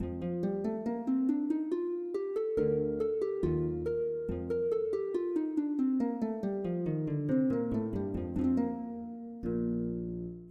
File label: Cadence, MIDI